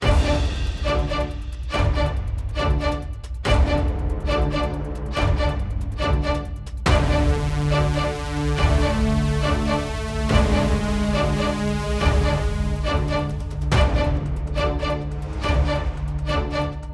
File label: Music Reactor Sub Critical